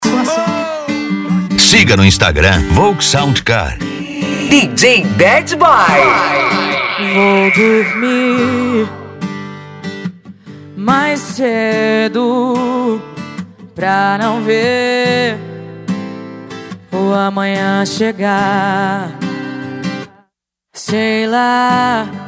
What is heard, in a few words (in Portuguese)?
sertanejo e pisadinha; cd volks sound car